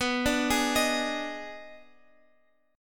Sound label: Eb Major 7th Suspended 4th Sharp 5th B